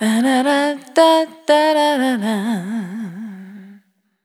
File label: Abdussa, Vocal Samples, Single Voice Melodic Loops; Singles